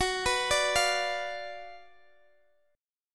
Gb Major 7th Suspended 4th Sharp 5th Gb, Guitar Open G; chordshape; down